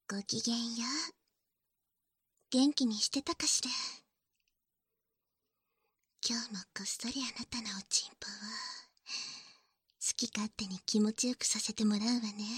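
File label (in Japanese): こっそり ねっとり 耳舐め 手コキ（バイノーラルマイク収録 効果音あり大きめ）; バイノーラル編集 効果音あり; 効果音差分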